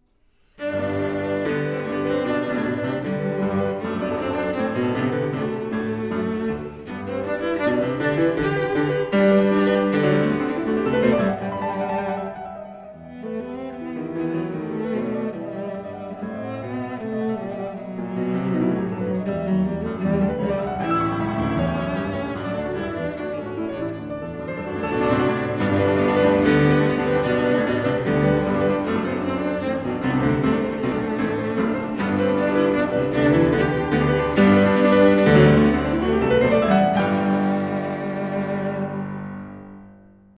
12 Variations for Cello and Piano

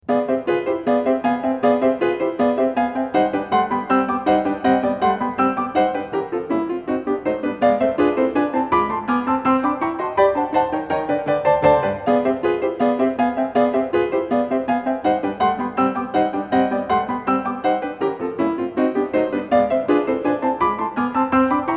33 Piano Variations in C; Anton Diabelli

